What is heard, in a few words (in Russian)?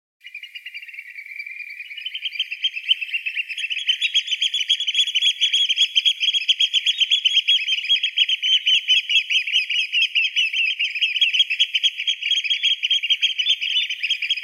tokovanie neskolkix ptic na zemle, tringa guttifer; animals; vertebrata; aves